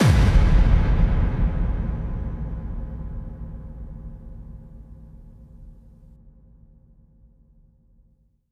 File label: bassdrum reverb FX kick; Vengeance Samples